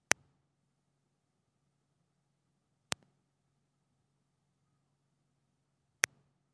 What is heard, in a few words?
Recording Noise